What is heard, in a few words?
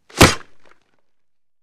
Football Helmet Pla, football